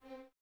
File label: Violins C 4; Miroslav Vitous; String Ensembles